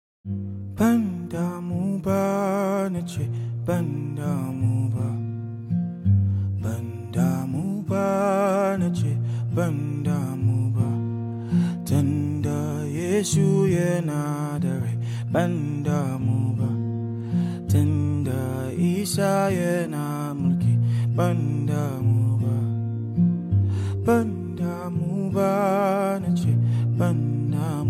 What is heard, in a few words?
Acoustic Cover